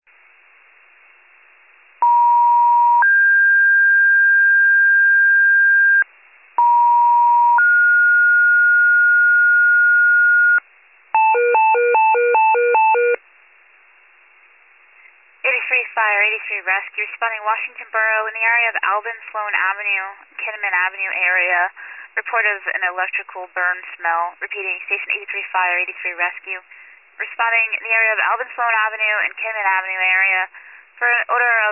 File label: fire, pager alert